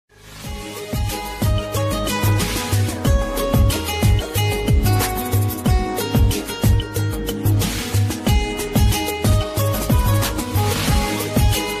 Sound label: movie ringtone instrumental ringtone; Salman Khan movie